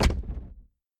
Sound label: reel Bounce; jumanji